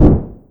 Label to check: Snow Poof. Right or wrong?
Right